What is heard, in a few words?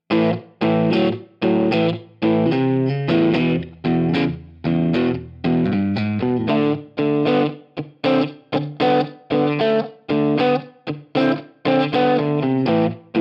Dirty Neck Middle - Marshall Plexi, pickups; three Fedora pickups (6K each)